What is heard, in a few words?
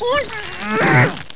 death; kenny